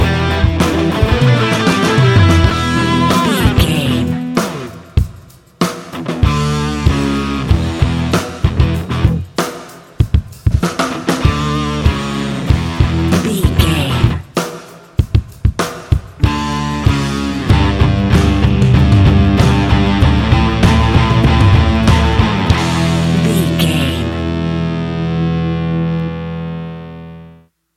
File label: riffs and dirty chords, A rocking music track with rock guitars, heavy rock drums and thumping rock bass lines; Heavy, loud, aggre